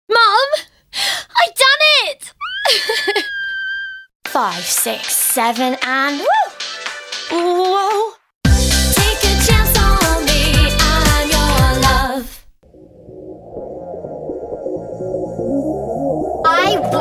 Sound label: Idents Music